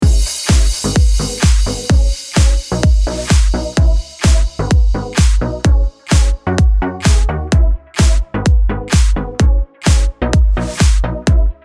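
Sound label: ringtone mobile; زنگ موبایل ساده و شیک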